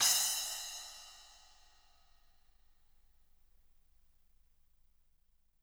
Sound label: SPLSH, SPLASHES